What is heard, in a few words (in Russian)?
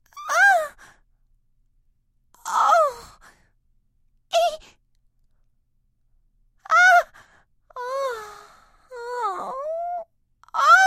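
zvuki zhenskikh stonov, female moaning sounds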